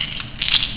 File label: coins; openrsc